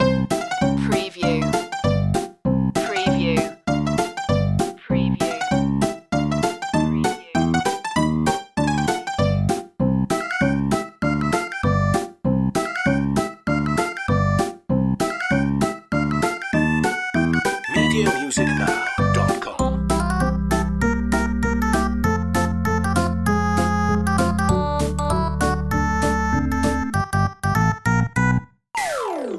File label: retro video game mushroom craze loop